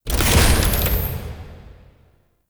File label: UI Mod Activate Generic; Warframe; Railjack; Player Ship